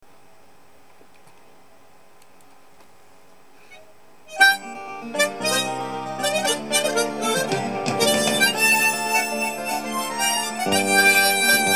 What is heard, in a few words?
harmonica